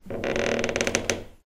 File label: door creak short; Poppy Playtime